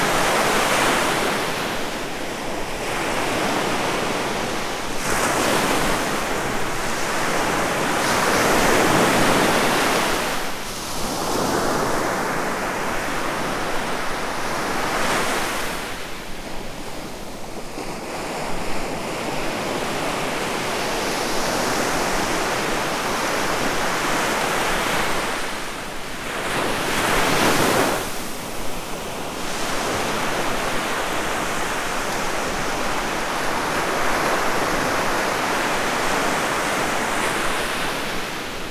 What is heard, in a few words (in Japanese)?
海の音